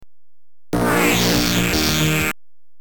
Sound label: level start; zoom; amiga